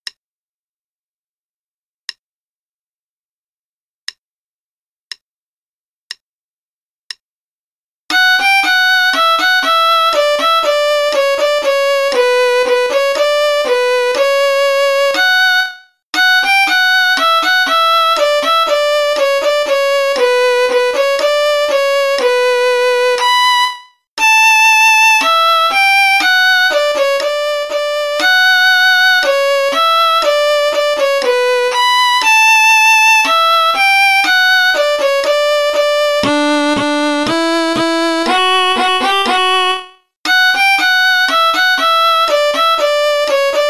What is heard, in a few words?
Play-a-long Concerto in B minor, qn=60; Rieding; 3rd movt 1st page only